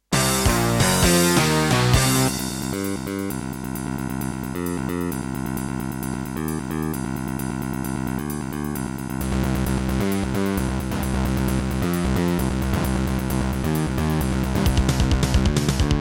Roland SC-88 Pro 55MAP; sigil doom episode 5

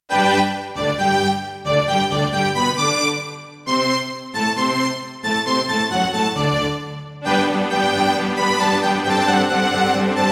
Roland SC-88ST Pro 88 MAP; Mozart - Eine Kleine Nachtmusik partial; classical

